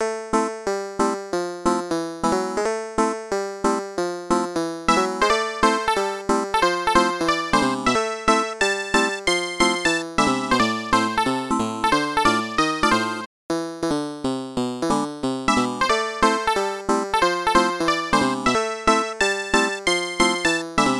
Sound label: In Game Music; grand dad